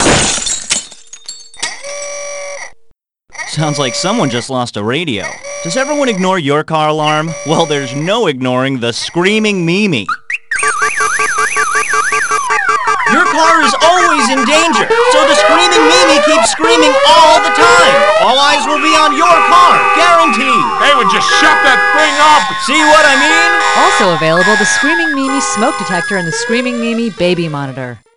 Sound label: Screaming; You Don't Know Jack